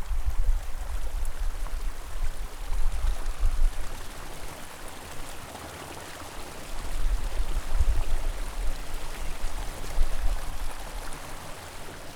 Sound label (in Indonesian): suara pengairan sawah dan tonggeret